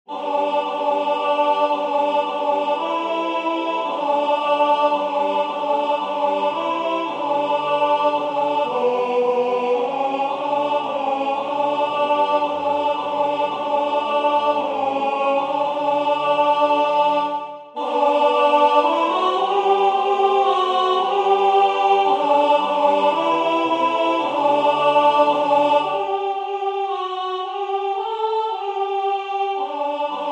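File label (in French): alto, Musique sacrée; Adeste fideles Willcocks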